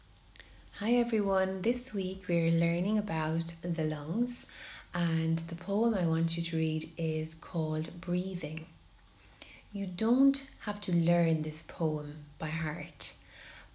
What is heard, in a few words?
breathing